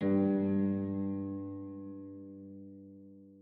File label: harp